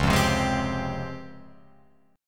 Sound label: C sharp Major Sharp 11th, Guitar Open F; C#M#11; strummed; tuning=Open F; guitar chord